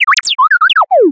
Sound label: Little Droid; Sci Fi; 1001 Sound Effects